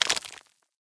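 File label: movement knuckle; Smite; Cabrakan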